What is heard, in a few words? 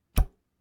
arrow Shot